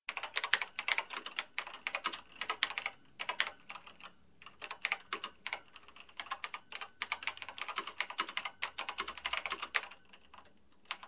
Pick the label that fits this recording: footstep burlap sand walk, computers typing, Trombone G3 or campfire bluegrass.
computers typing